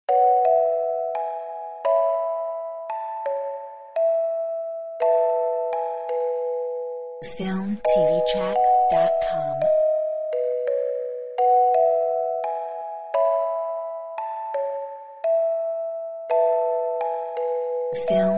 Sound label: Christmas lullaby